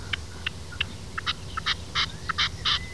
Pernice rossa

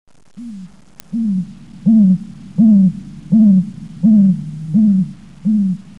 Allocco di Lapponia

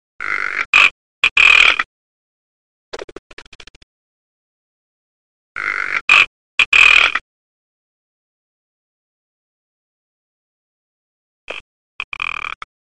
Pernice bianca

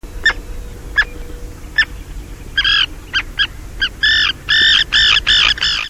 Sterna o rondine di mare

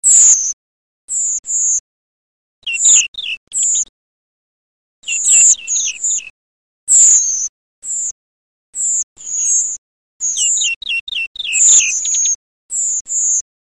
Tordo sassello

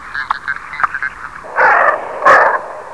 Quattrocchi d'Islanda

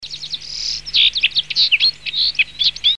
Venturone, uccelli

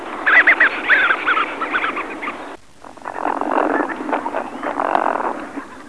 Moretta grigia

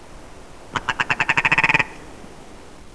Pernice bianca nordica, uccelli

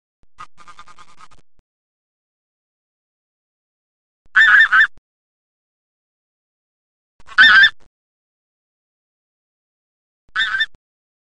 Oca granaiola

